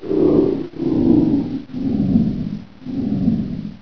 fan off; source engine; hl1; video games

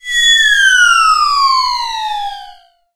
grenade jump fall; Source Engine; tf

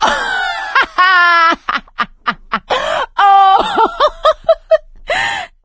laughter, survivor, voice; source engine; left 4 dead 2; producer

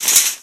metal chainlink impact soft, physics metal; source engine; left 4 dead 2

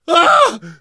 hurt critical, survivor voice

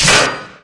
door malfunction open

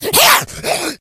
rage, infected, male

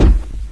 far foot light, antlion guard; npc; source engine; episodic

